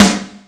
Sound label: Snare, Yamaha RY-30 SNARES